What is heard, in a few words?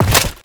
metalarmour equip; inventory